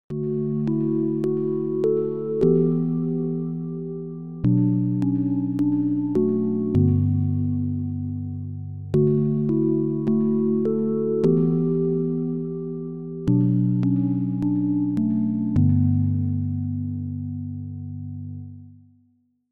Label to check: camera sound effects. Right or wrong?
Wrong